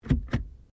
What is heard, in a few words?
Car Ford Focus Gear Change When Off; Ford Focus hire car; Cars (Sound Effects:Vehicles)